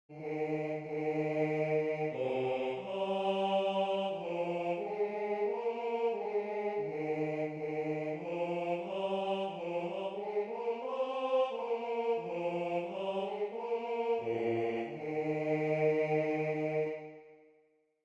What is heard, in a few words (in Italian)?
Noel Basso; Natale; Base basso; Noel (Tradizionale)